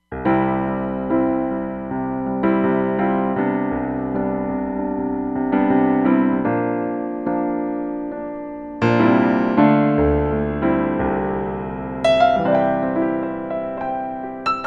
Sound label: Studio Grand, Roland Fantom XR